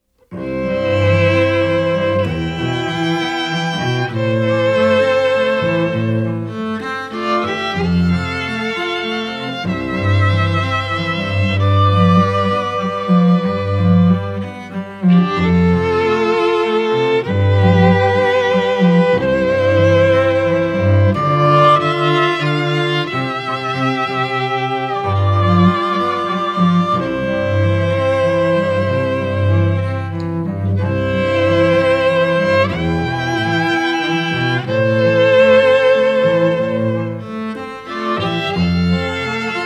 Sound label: Trio, String Trio